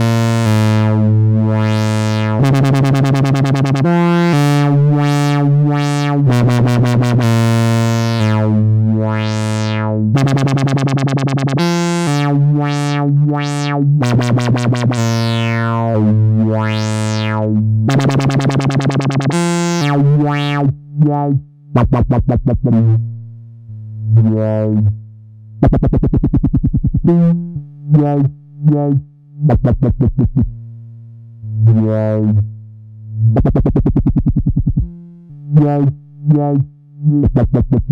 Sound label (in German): Voice Filterresonanz LP